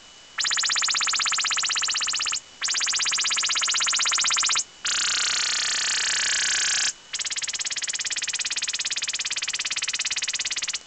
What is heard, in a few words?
ROTHR 5x9kHz; AN/TPS-71 OTHR sending 5 bursts with ~9 kHz BW (2 x 16 Hz, 1 x 31.2 Hz, 1 x 17.5 Hz, 1 x 19.1 Hz); US NAVY RELOCATABLE OTHR SYSTEM; ALE-systems, tone calls, ionosondes & chirpsounders